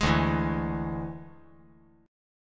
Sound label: D Suspended 2nd Flat 5th D, chord